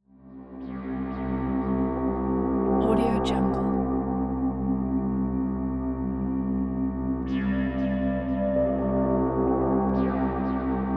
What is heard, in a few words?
futuristic ambience